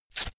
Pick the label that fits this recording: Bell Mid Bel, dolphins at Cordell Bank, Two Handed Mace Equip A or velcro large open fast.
velcro large open fast